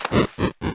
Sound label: Lava